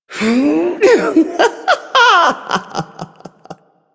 Lift Laugh, Corpus Lich; Warframe; Persona C

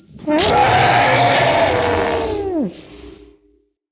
Ancient Shell Player Spotted Scream, Infested; Enemies

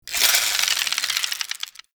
Mace Spindown; Warframe; Duviri; Dax; Mace And Shield

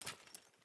Grunt Foley Movement Armour; Enemy; Sinecure; Melee Grunt

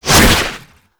Whip Whoosh; melee; weapons; Oraxia

